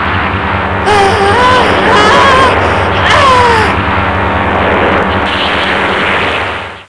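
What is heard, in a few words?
little scream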